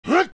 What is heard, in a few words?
Attack; Dark Link; zelda; OOT